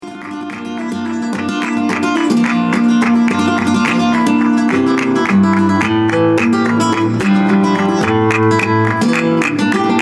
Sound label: Buleria, Flamenco Guitar; Hector Fernandez Music Inc